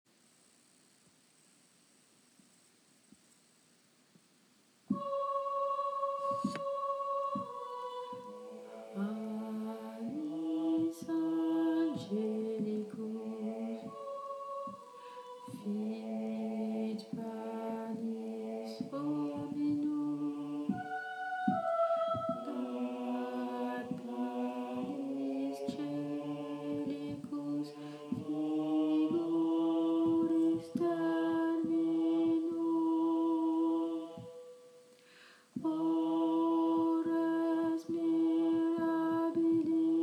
panis basse; Panis Angelicus

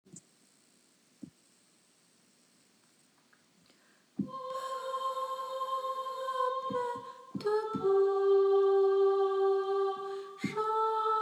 minuit tenor; Minuit chrétien; choeur

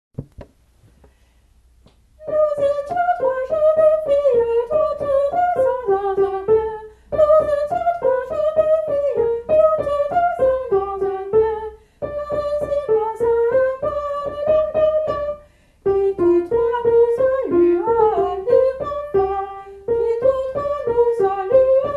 Jeune fille tenor

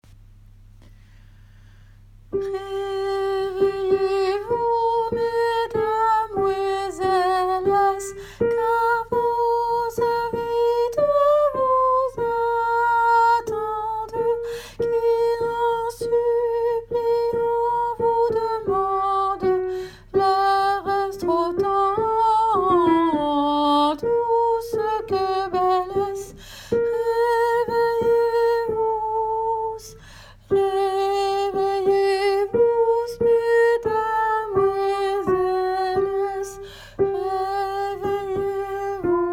Reveillez vous. Soprano.; Pierre Sandrin – 1547 22ème livre Attaingnant; Reveillez vous mes damoyselles

